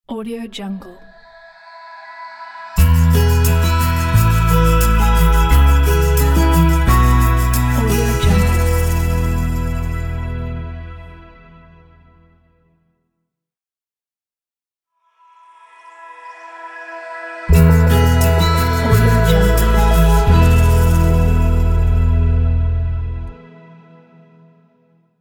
fingerpicked acoustic logo

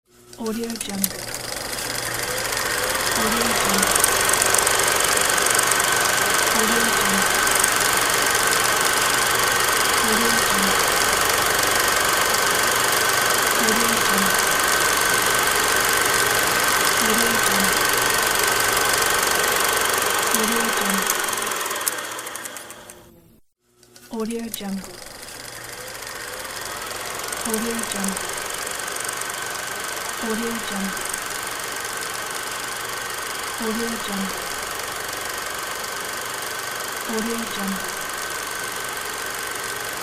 old movie projector

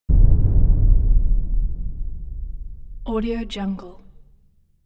sonic boom

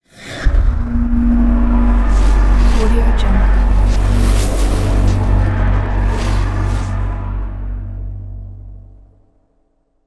time distortion

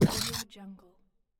game large monster hit sound

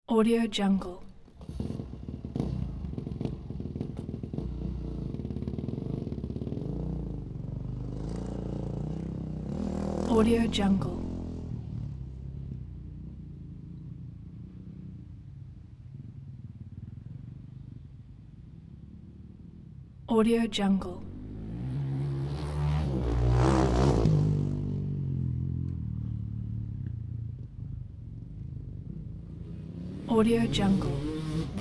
motorcycle dragster burnout; sound ideas

